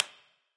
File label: Clap; smite; bakasura v2 skin8